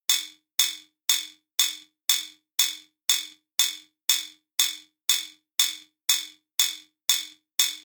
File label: Timer Loop, alarm; Timer Loop 10; Alarm Sound Effects; electronic; Loopable; Andrew Fleming